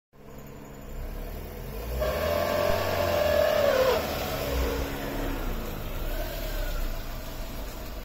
grinding noise 1st gear only when pulling away, mazda 323f